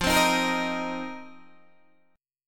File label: F 7th Suspended 4th F, Guitar, DADGAD